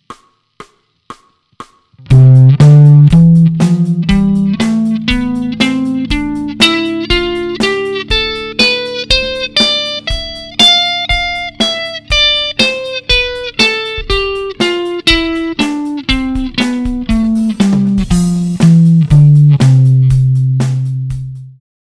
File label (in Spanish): Escala Mayor 120 bpm